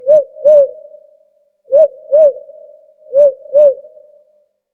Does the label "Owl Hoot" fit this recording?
Yes